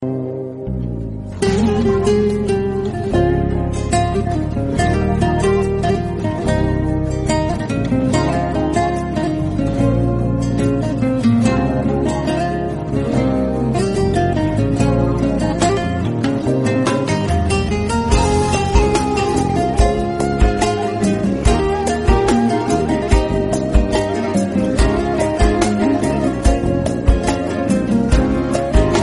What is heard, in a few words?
sad turkish violin beat instrumental